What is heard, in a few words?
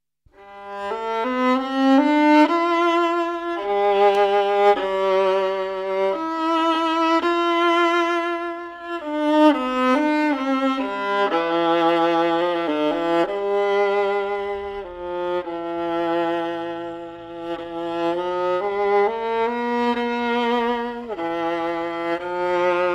viola